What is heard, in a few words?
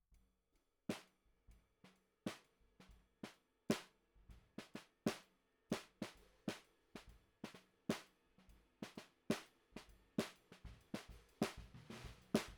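Snare Bottom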